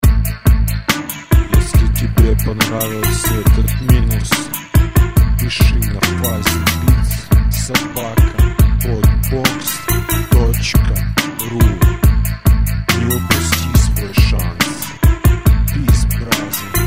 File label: bounce